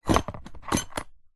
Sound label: cardboard box small containing metal screws and other pieces short movement; zapsplat